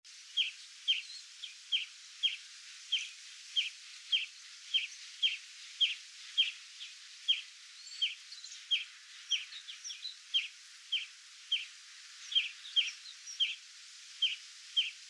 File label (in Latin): Chloris chloris; SETE